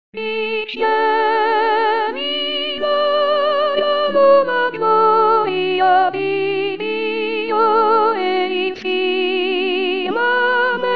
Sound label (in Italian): soprani